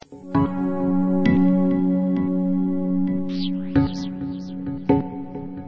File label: melody